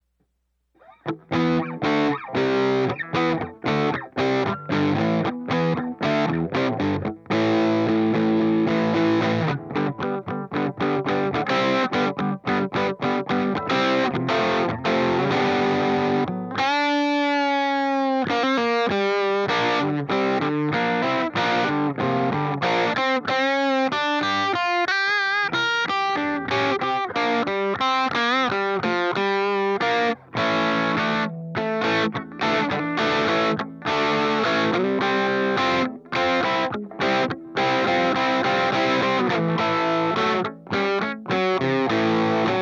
crunch

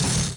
kick crash

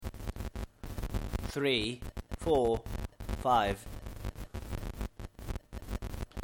funny noise

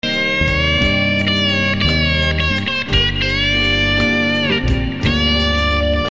tele snip; 4033 vs V67 vs C1

